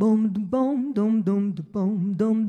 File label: Jazzy Solo